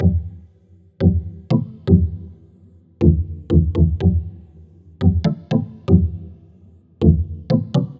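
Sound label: 120 E minor BASS; SHKAR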